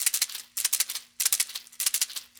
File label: Seed Pods, Ethnic Percussion; Sonic Foundry (Sony Creative Software); Ambient Atmospheres & Rhythms